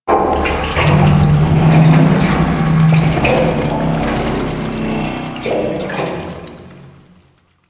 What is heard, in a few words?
Metal Pipe One Off; Ambience; Props; Warframe; Entrati